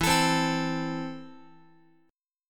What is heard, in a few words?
E Suspended 4th Sharp 5th, Guitar; Esus4#5; strummed; x,12,12,10,13,x